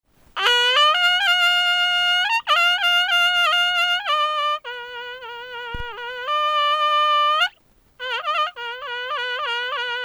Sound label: mapuche nolkin